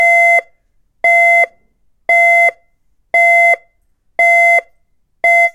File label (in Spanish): Tono Intermitente